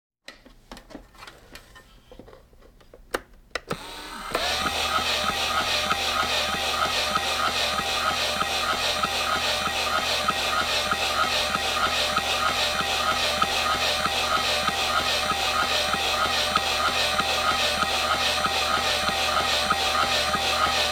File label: Hospital effects